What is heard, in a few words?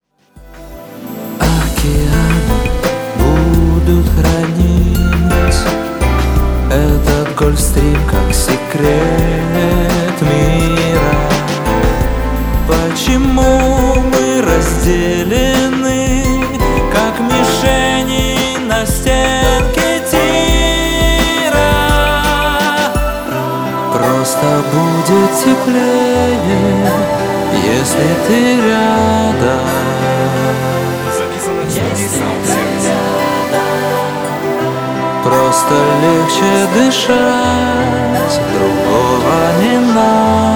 ocean